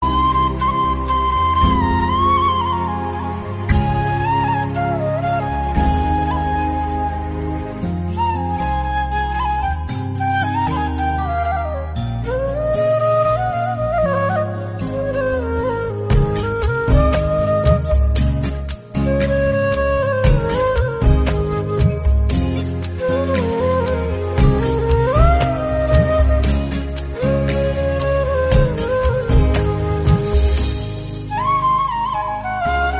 flute bgm; gadar

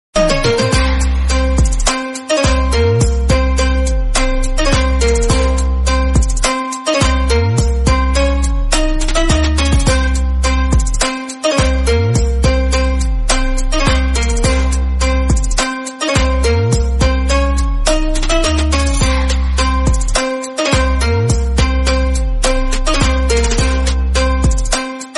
intro bgm theme; rocky bhai

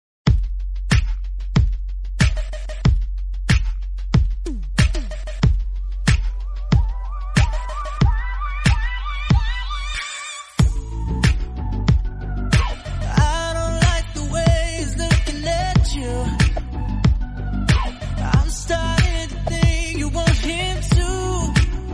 english bgm

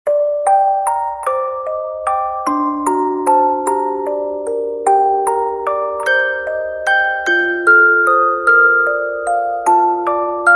music box bgm; howls moving castle

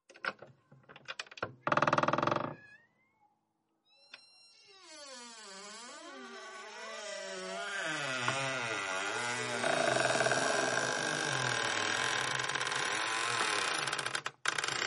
old door sound

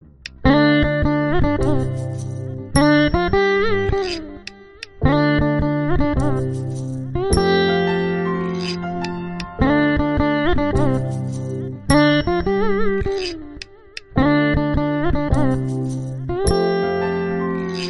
telugu song

